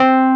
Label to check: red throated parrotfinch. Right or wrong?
Wrong